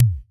acoustic tom drum sound a sharp key, Royality free tom drum single hit tuned to the A# note